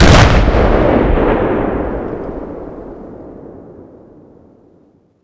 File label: rocket explosion distant